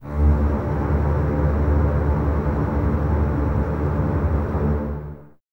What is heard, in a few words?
D 2, Basses Tremolo, String Ensembles; Miroslav Vitous; 90 s Sample CDs